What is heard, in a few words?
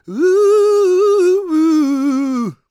GOSP MALE, SOLO; Vocal Planet Vol1 Gospel